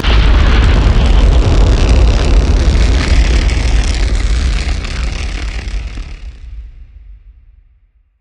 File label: Discharge Large; Explosions; DynamicEuropa